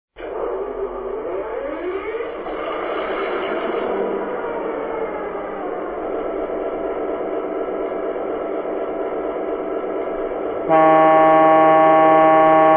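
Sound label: MP15DC 5 chime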